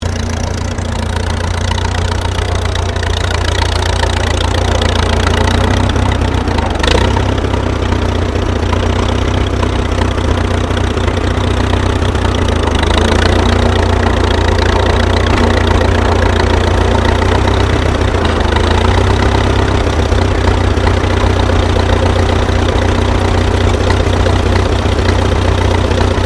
Tractor Mccormick Deering W12 Driving, Old Farm Tractor; 1937; Tractors; Vehicles